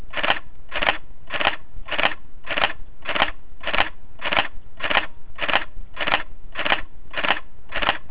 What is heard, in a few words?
liveview, Sony Alpha DSLR-A330